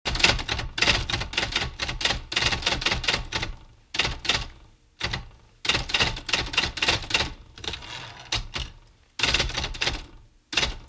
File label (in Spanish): una maquina antigua